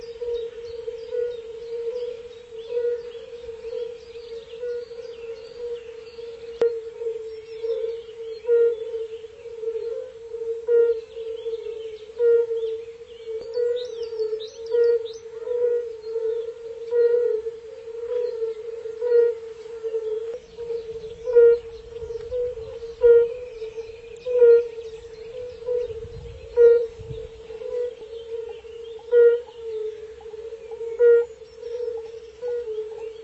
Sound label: Bombina bombina